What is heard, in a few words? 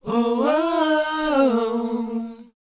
WHOA A#, Vocal R&B & Dance; ILIO; Vocal Planet